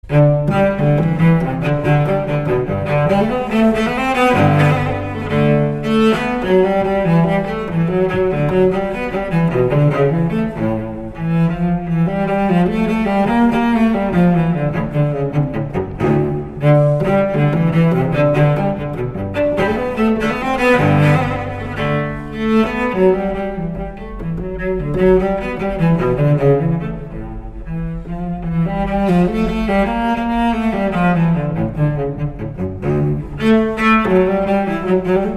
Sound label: suite num 1 per a cello, en sol majeur, gigue; J. S. Bach